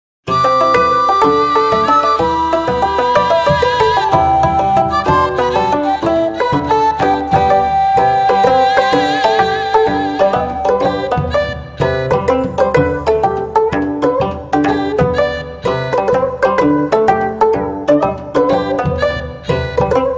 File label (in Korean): 국악 Ver 무가사; SRT 로고송; Train Announce